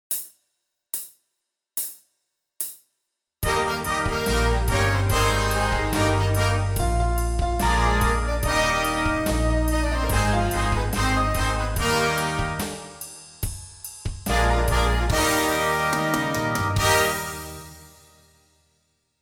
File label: rhythm study